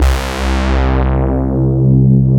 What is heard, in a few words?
CMI PIANO